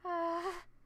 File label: Hhhhh; Momiji